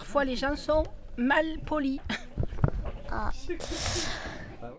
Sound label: ah sniff; gens malpoli